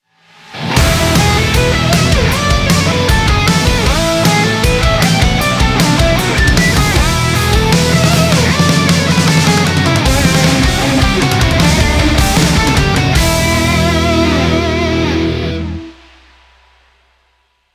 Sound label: Mission Completed; jets n guns 2; ps4 ps5 switch windows